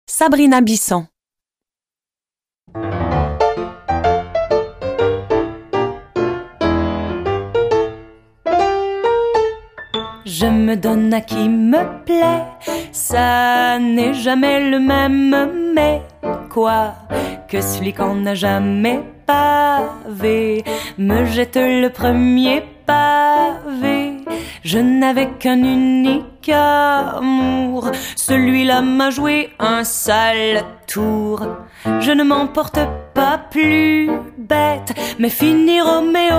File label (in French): chant JE ME DONNE